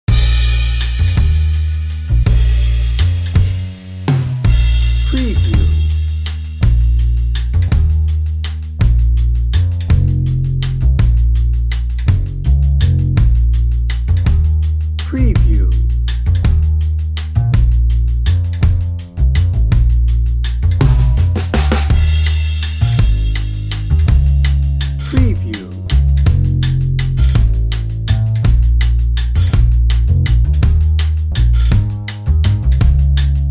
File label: wine and romance dnb